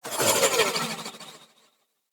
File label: Decoy Arrow Whiz By; Powers; Fey; Saboteur